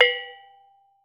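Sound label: PANARUS, Metalophones, Pitched Percussion; E-MU Producer Series Vol. 7 – Old World Instruments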